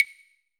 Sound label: clave; Greytsounds; Scott Peers Sound Engineering